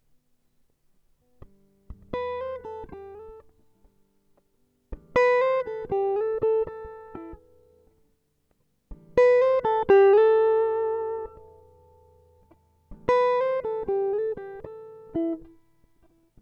Am60 GTR impro

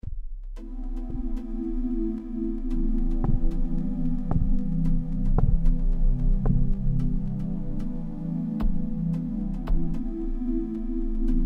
Em112 Groove

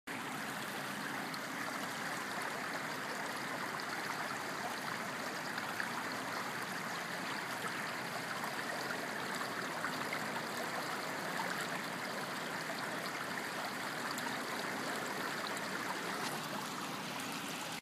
Bird Water Wind Movement

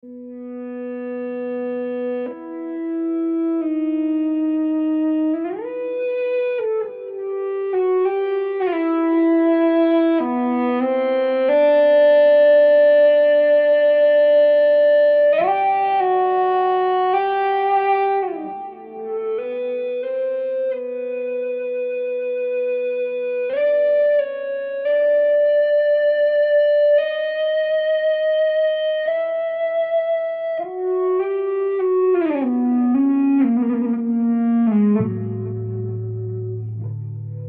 Ebow

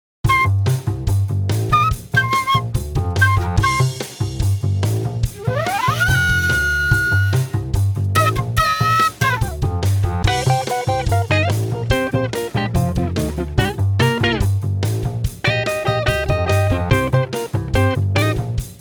Campaign Jazz

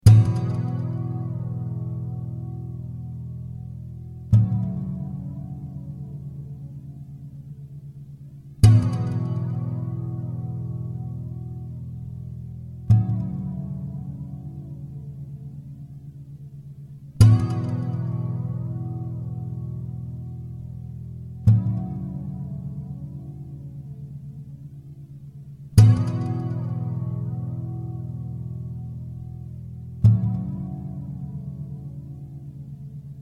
E112 2 chords delay

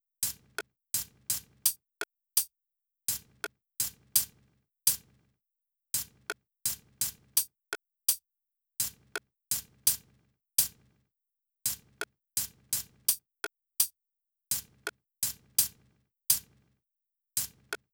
drums

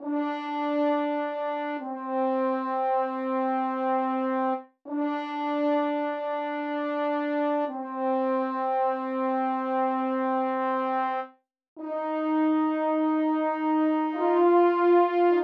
French Horns

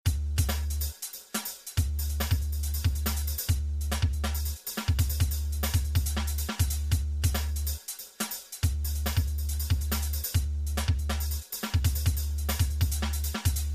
Nihilism Drums

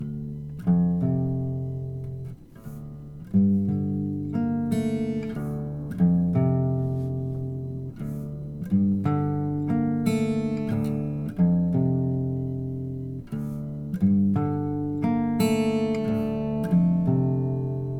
Dm 90 Acoustic Riff GTR dry

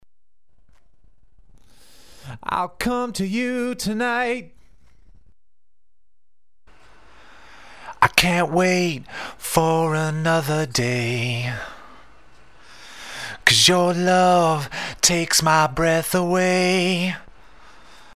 MALE VOX

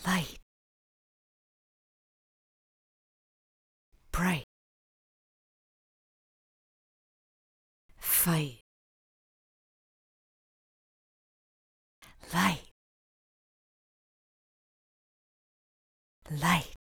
stems vox

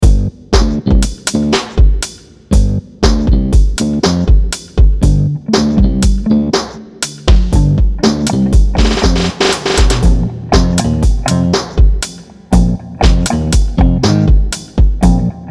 Dark Church

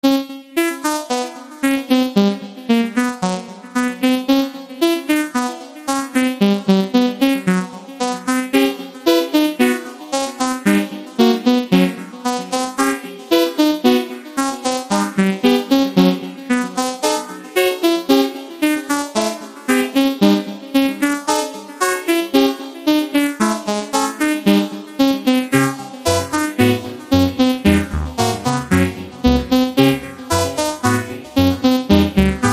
Hexamental Melody

